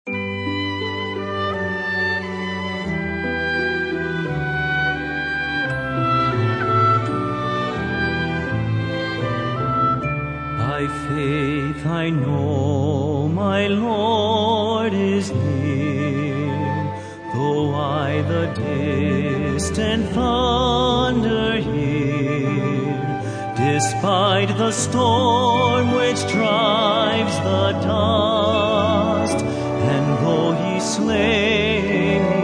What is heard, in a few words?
Singers And Orchestra